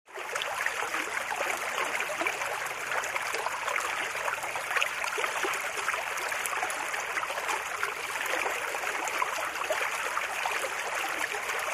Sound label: Water Lapping Water, streams